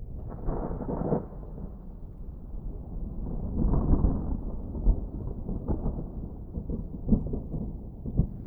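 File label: dark clouds thunder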